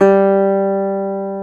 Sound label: GTR, Dobros, National